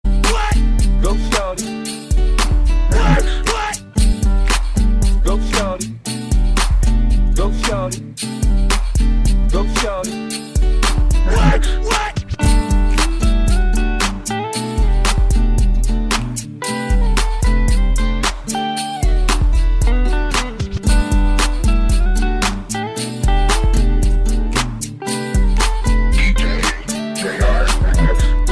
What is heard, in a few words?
Remix Gitara